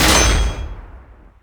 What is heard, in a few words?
baz hit metal; WAR